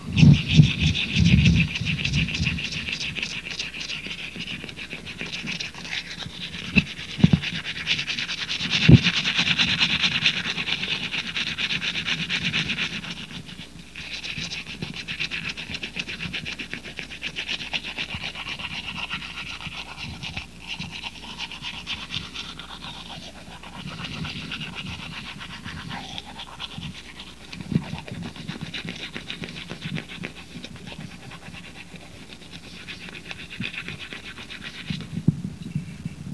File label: BRUSH TTH